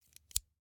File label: Scissors, snap, snip, shear, small med, single; Eiravaein Works; Vaeyan IV